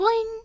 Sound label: twirl bounce; sm64coopdx; char-select-extra-chars; peach